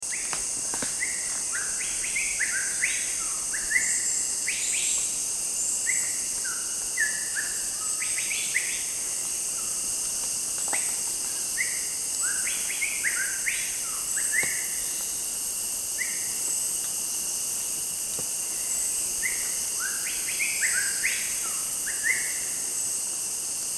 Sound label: Phil Fairy Bluebird Bohol